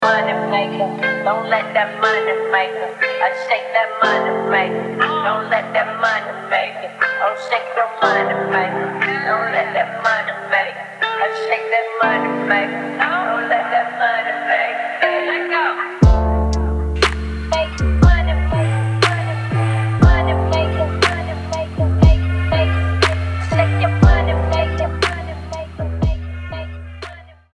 (lo-fi flip)

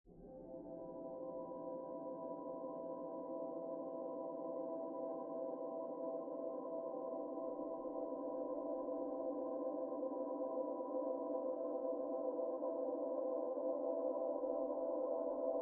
Choir Section

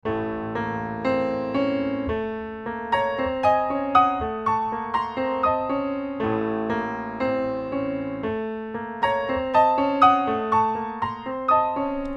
Alto 2; Mt Rushmore Mvt 4

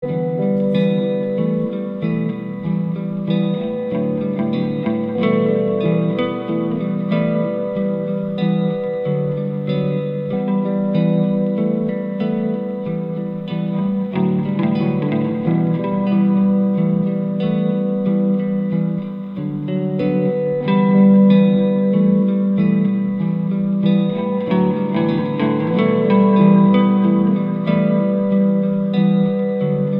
slow moving

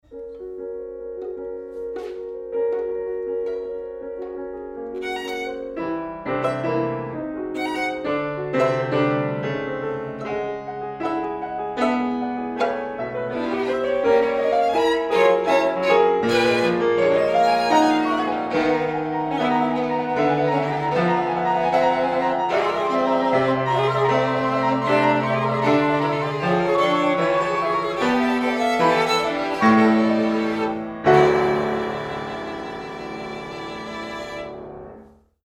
Violin Sonata

